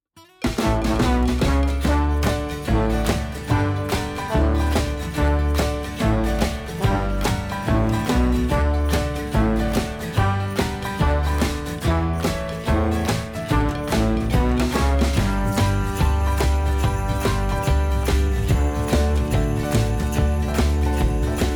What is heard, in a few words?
BGM; Minsei Iin